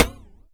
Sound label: impact Plate light; Kenney's Sound Pack; Impact Sounds